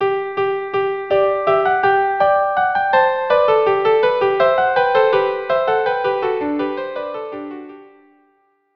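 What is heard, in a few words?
Menuet italien viola viola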